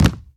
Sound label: stomp wood; Scrat